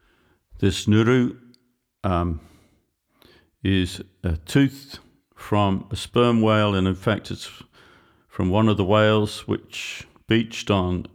Nguru Tohora

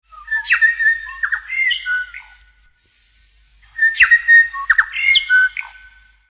Korimako Manu

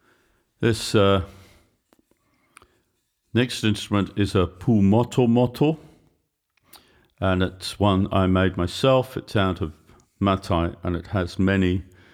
Pumotomoto

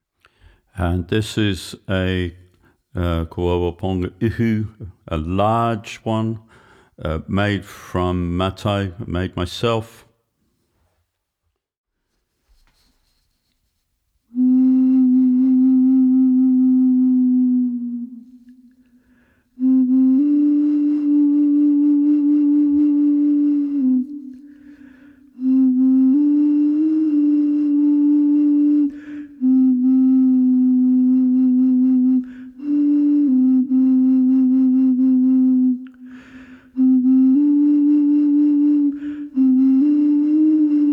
Koauau Ponga Ihu Nui Matai

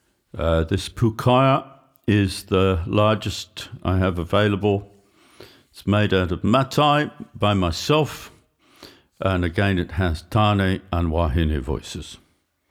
Pukaea Nui

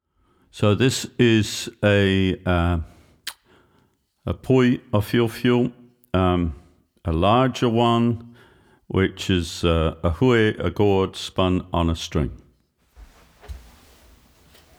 Poi Awhiowhio nui

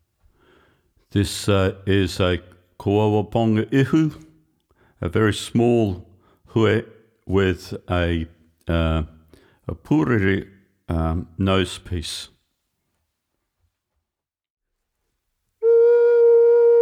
Koauau Ponga Ihu iti

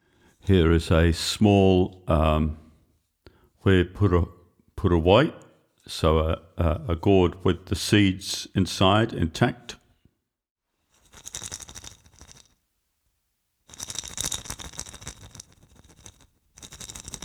Hue Puruwai iti

